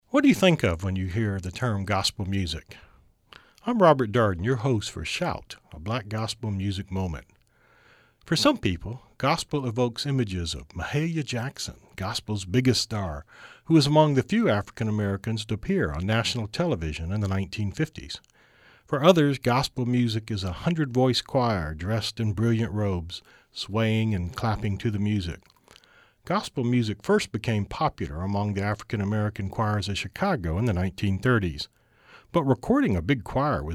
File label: mass choir